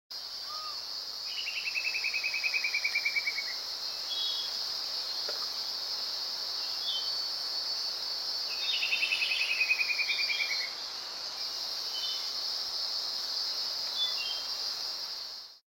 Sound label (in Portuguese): Canto do arapacu barrado